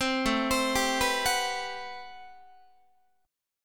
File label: A Minor 13th A Guitar; Am13 arpeggiated; JGuitar